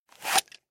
zvuki politseiskoi dubinki

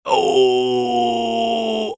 zvuki smerti i boli

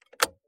zvuki povorotnikov u avtomobilia

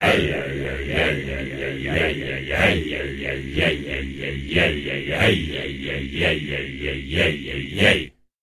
zvuki shamana

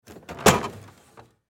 zvuki kseroksa

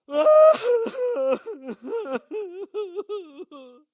zvuki muzhskogo placha

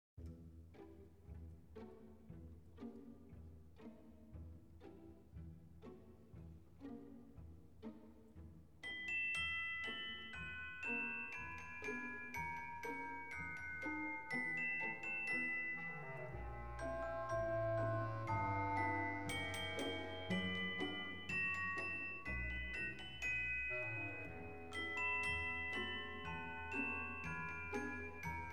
uiutnaia muzyka bez slov dlia novogo goda

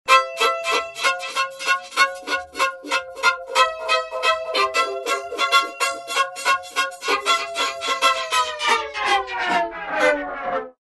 zvuki skripki

